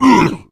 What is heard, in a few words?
male zombie growl; player; voice; source engine; left 4 dead 2; boomer